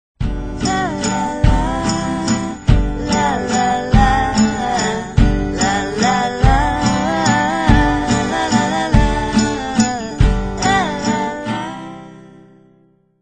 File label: waltz